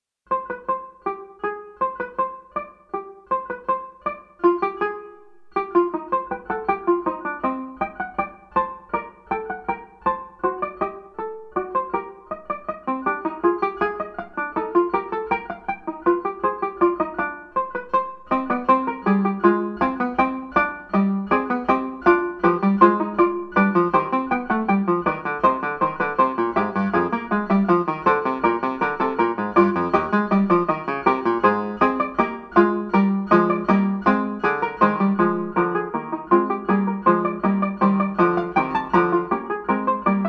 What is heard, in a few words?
tanbur; bach